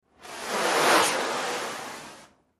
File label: Blow Torch Movement Back And Forth